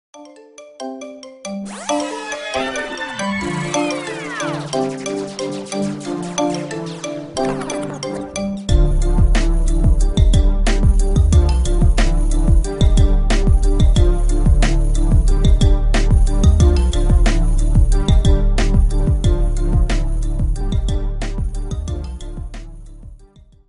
marimba ringtone remix

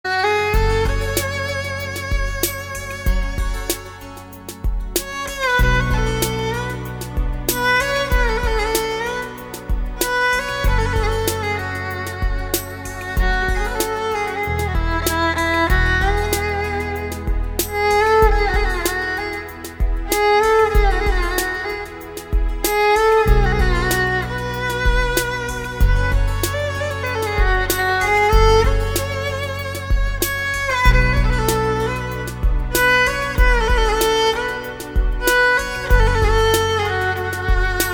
instrumental

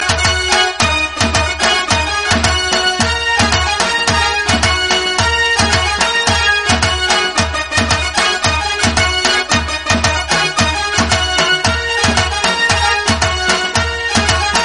romantic love instrumental

